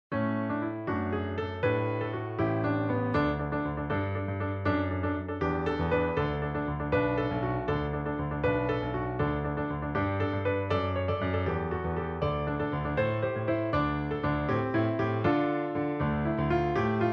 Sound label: song piano; Little Mermaid; Eric; Accompaniment; Disney Cruise Line